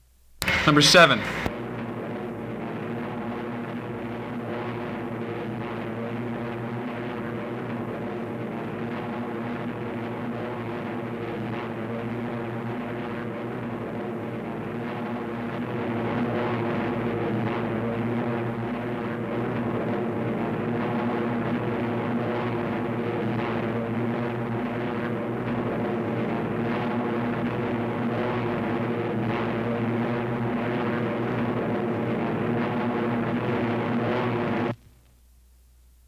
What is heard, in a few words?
Wwii Stuka Engine Drone